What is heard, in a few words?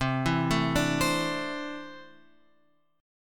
C 6th Add 9th C down Guitar Open F; C6add9 arpeggiated